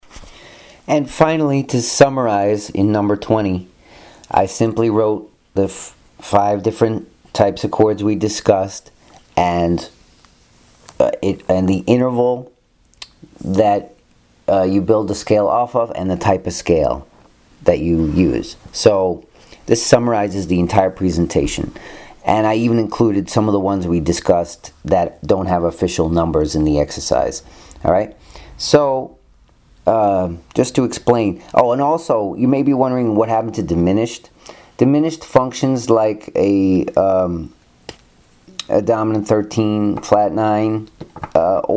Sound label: advanced minor pentatonics